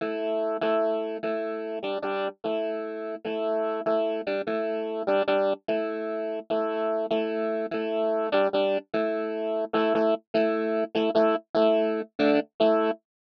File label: keys the chorus